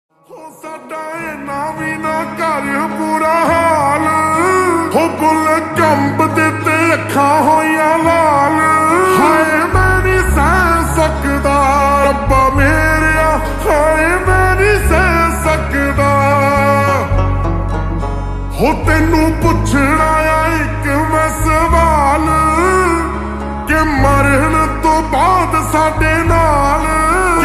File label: Slowed Reverb Ringtone